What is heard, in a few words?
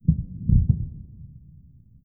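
background combat; soundscapes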